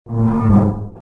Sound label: beam creak; movers objects